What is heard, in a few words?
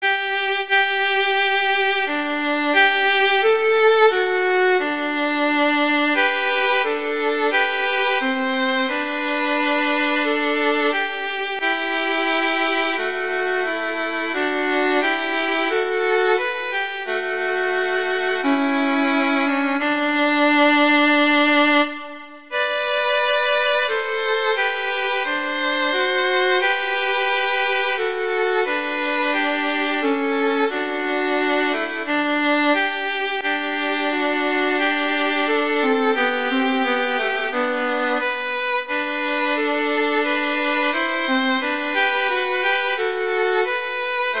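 O Come All Ye Faithful, Violin Duets; Christmas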